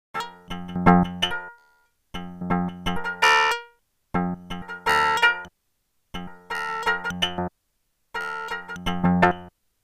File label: jingle; LaTele